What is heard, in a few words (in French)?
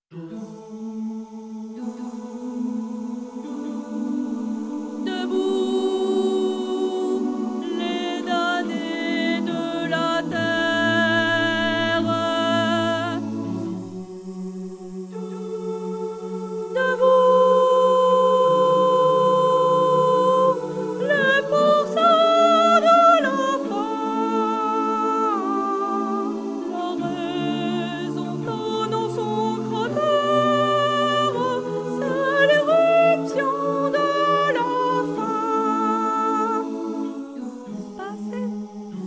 soprane; L internationale